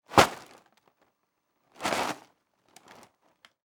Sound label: Foley, Cereal Box, Hit, Crinkle